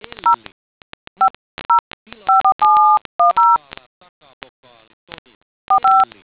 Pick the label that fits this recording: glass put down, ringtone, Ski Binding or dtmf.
dtmf